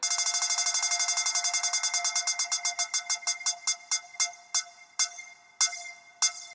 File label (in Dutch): Muziekfragment Hedendaags